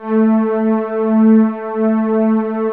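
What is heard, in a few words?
A4 ANA PAD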